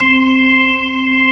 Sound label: B3 ORGAN, Dance Organs; Techno Trance